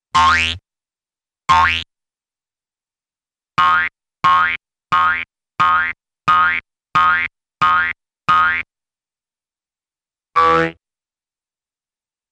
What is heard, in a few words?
Boink-boing-Bounces, Cartoon; Hollywood Edge; Cartoon Trax